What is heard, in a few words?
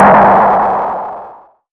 Impacts